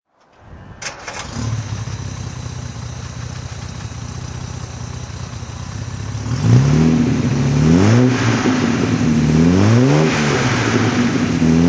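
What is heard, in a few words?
austin healey 3000 mk3 throttle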